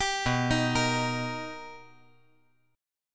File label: B Augmented Major 7th B, chord